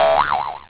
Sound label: bong; xfce